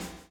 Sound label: BLOW; Spectrasonics - Backbeat